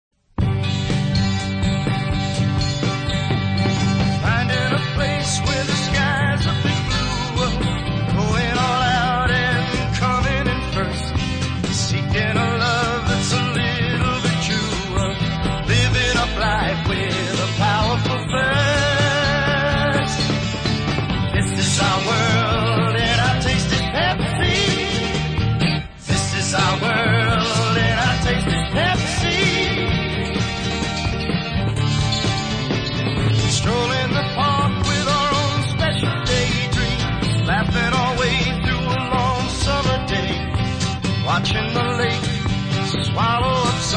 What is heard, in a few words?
Guitar; Pepsi International